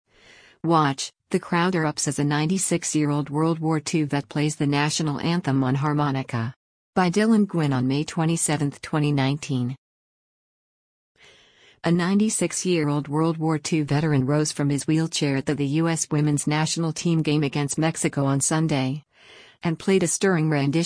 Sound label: crowd erupts, vet plays national anthem harmonica; 96-year-old WWII vet